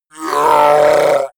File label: orc call pain long; kreaturen